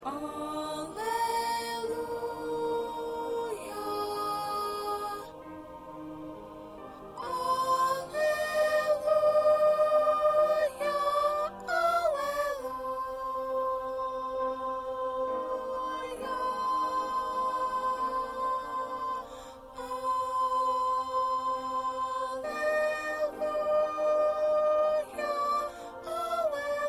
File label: 2nd Soprano